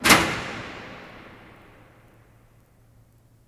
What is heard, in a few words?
Prison Door Lock; jail & prison doors